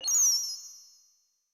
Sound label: Entrance Magic